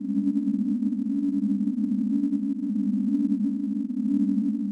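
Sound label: Unlimited Force loop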